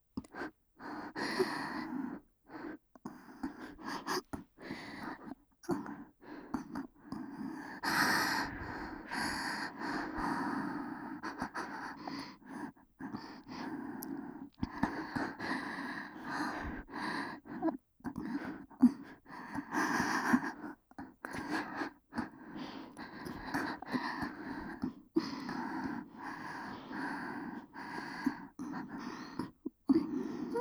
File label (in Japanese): 溺愛双子姉のW手マン&耳元密着喘ぎループトラック, ASMR